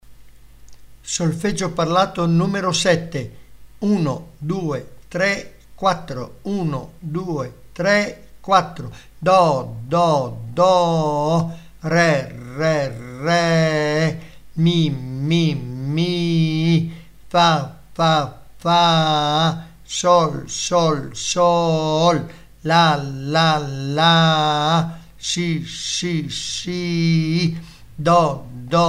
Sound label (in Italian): Solfeggio Il mio amico flauto